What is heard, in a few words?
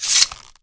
bow out; The Elder Scrolls III - Morrowind; Morrowind GOTY GOG